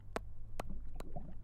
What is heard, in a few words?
pop pop pop